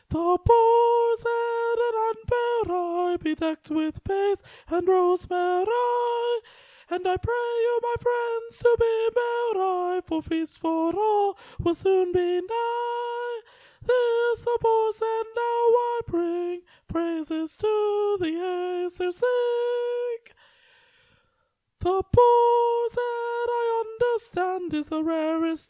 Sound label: carol soprano; choir